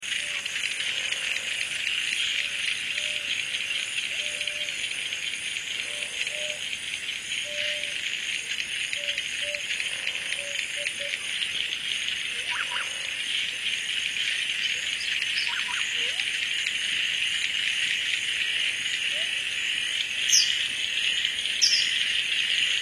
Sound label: ambient swamp day loop